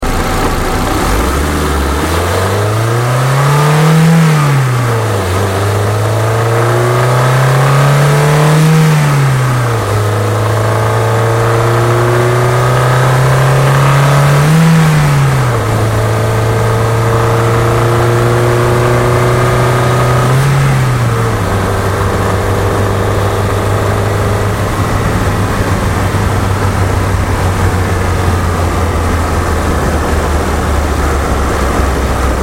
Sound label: Ford Fiesta Hard Acceleration Outside